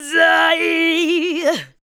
ADLIBS

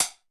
Bamboo, Alesis D4

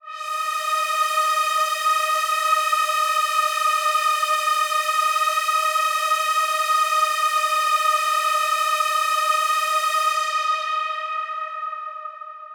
Big Pad D#4; KG MegaSaur

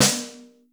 LOOSE S; Crypton - DrumBase5000; R70 KIT #1

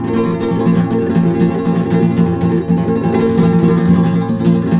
B M, Guitars; Spectrasonics; Hans Zimmer Guitars VOL-2; FLAM TREM CH

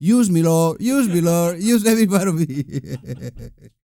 LAUGHS; Spectrasonics; Vocal Planet; Jazz FX

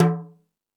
More Toms, Drum Machines

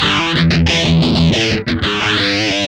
GUI 90 LICKS

